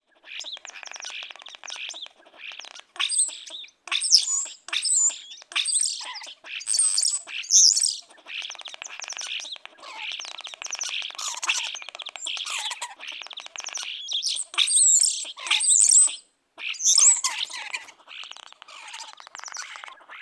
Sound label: animals birds mother feeding babies in nest